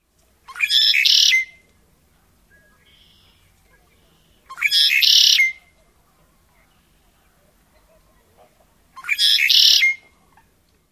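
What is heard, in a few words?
songs, Red-winged Blackbird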